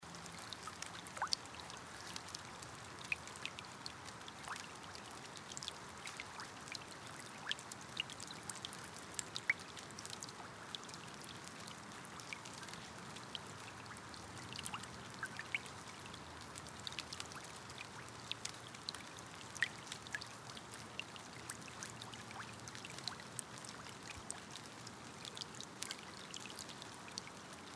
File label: rain on pond